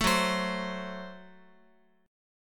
Gb Diminished Guitar; strummed; JGuitar

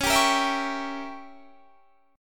Db Diminished 7th Db down Guitar Standard; strummed; Dbdim7 Chord; JGuitar

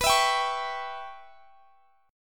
Db Minor 7th Flat 5th B

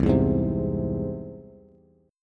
C Augmented, Bass, chord; strummed; JGuitar

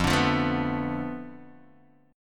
F 6th Flat 5th F down Guitar DADGAD, chord

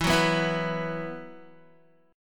E Suspended 2nd E, down, Guitar; Esus2 strummed

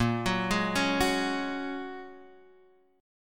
Bb Minor 7th Sharp 5th, Guitar, Half Step Down

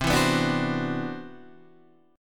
C Major Sharp 11th; CM#11 strummed

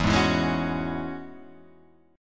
E 6th Add 9th E down

